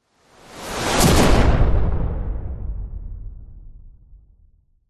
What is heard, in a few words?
explosion powerful whoosh into med large boom